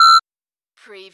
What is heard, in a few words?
Servo Panel Sound - Beep long